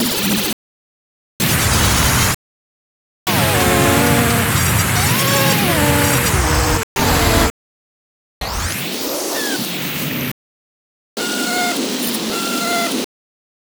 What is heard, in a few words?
bees knee sneeze